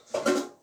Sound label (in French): metal fermeture couvercle casserole